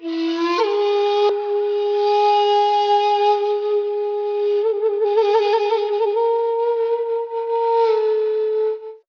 flute native, Loops, Jazzmatazz

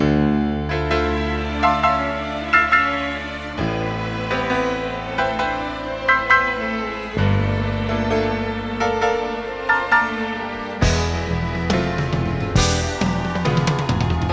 piano love theme, Loops Sad

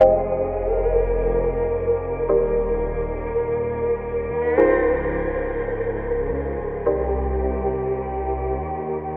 keys, Loops, Sad

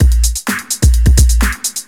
land of boom, Drum loops Electro

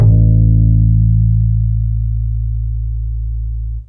bright, Bass

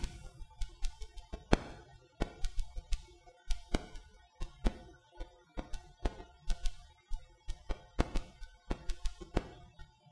crackles, Vinyl

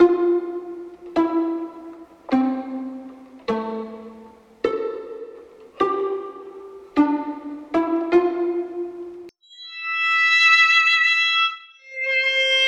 strings, Sad

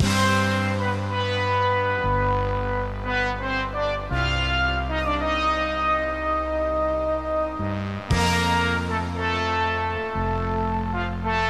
fanfare, loops electronic

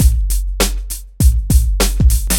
big ole bass, boombap drum loops

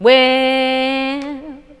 "well", SUNG

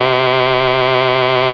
buzz in, Synths; Notes

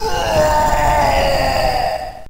scream

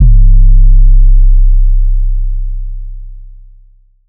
Kicks

